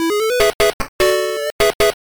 Game Victory; Kamen Rider Agito Kuuga Wild Battle; Pico

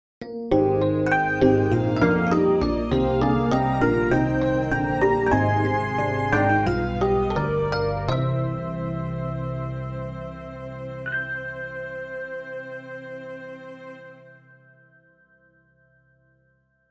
Jingle Gameover; Gensou Shoujo Wars: Dream of the Stray Dreamer

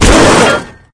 Vehicles Generic

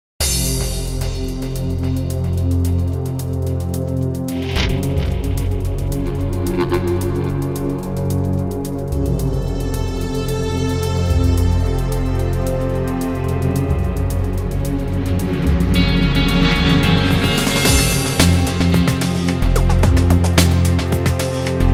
Loading Music Loop

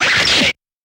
Kung Fu Fight Sounds; Kung Fu Fight Sounds Pack

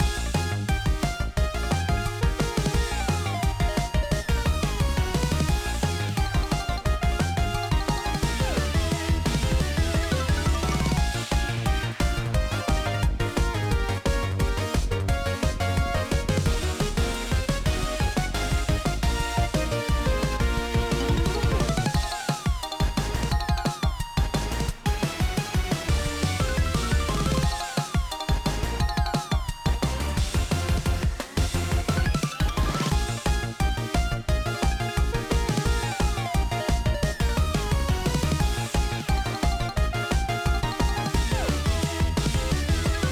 Field Scroll Loop; Glitch Busters Stuck on You

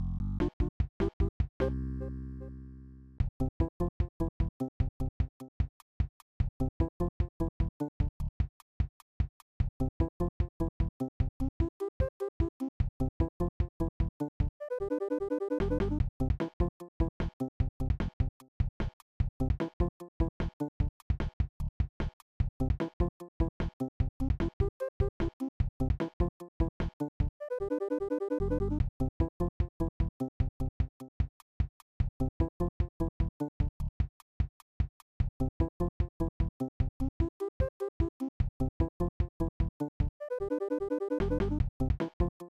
In-Game Music; Christmas Craze; snes

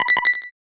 Press Start; Super Famista 5; SNES

